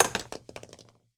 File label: grenade roll; Papers Please